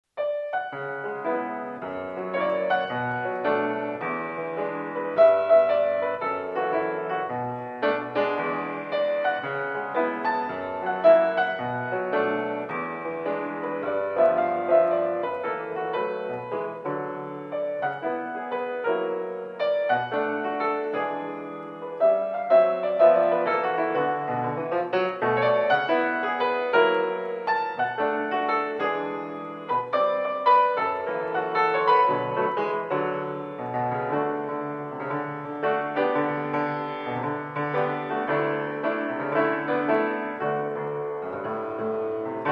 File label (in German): Jazz Stueck